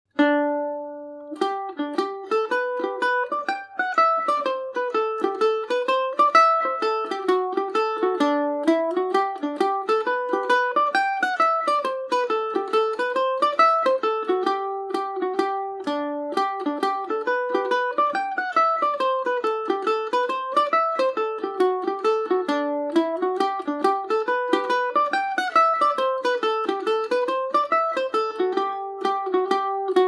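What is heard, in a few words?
G major, Irish mandolin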